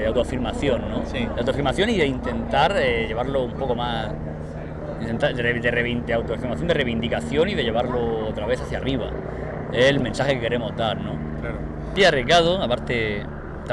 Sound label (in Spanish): Rock duro